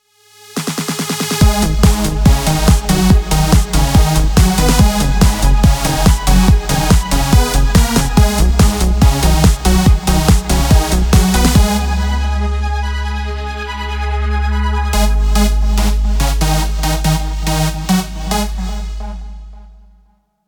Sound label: jingle; hogmanay20